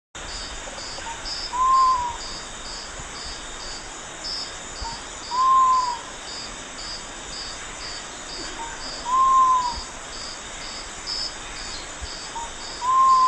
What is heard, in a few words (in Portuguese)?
canto do juriti safira